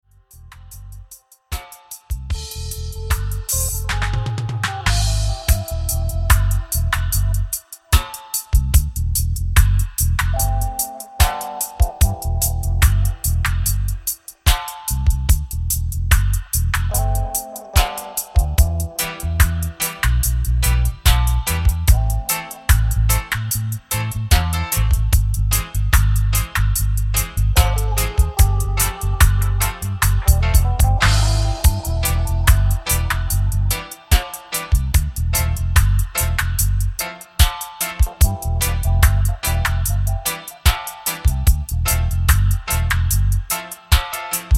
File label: Dub